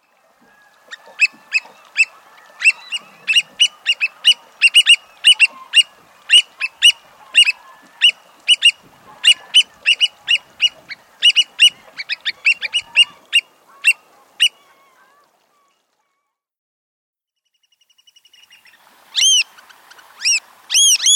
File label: Black Oystercatcher